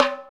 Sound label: PER Percussion